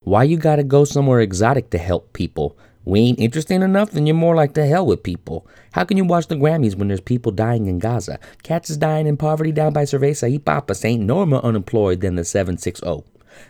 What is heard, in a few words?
helpings acapella